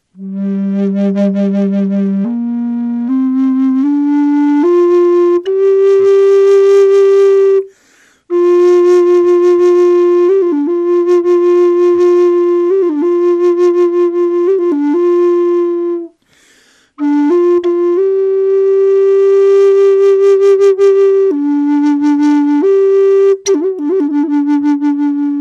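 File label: natural, wood flute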